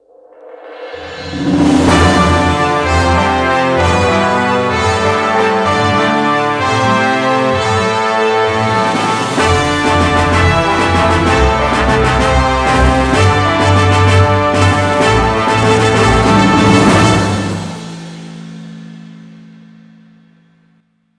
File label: Complete Fire; Emergency Heroes; wii